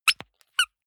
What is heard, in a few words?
squeaker squeeze with release hard